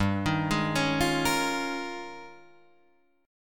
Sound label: D sharp 11th G